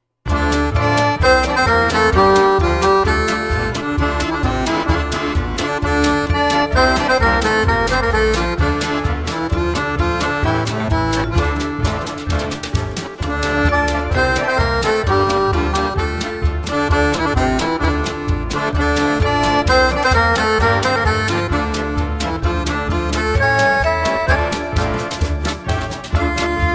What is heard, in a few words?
Klezmer